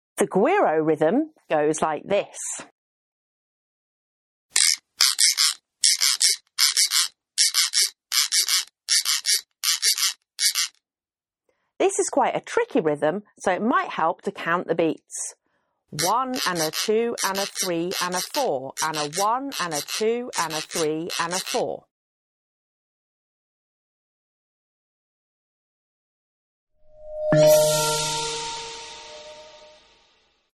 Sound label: Scraping